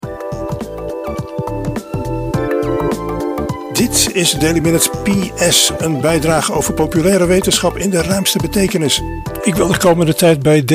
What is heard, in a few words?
Morse code; morse code reader